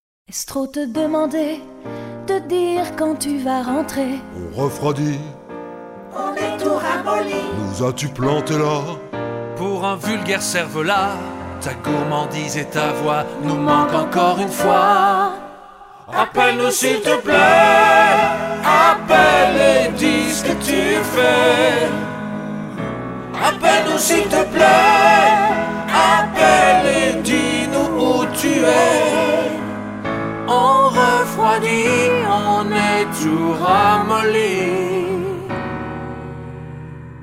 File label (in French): musique